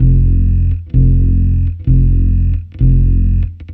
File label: Electric Bass; Sonic Foundry (Sony Creative Software); Hydroponic Hip-Hop